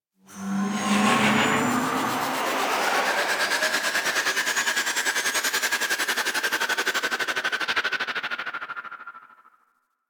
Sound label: Railjack Ship EMP projectile Travel; Warframe; DE; Vehicles; RailJackWeapons